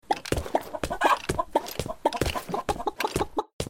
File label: emote chicken foley; fortnite emotes; athena